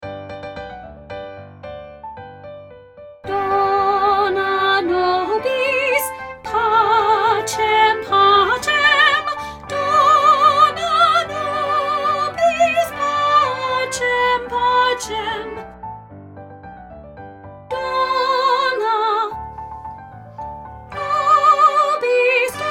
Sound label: Dona Nobis Pacem Soprano Dominant